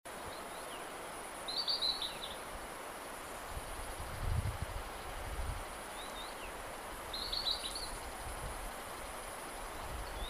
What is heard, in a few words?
collared bush robin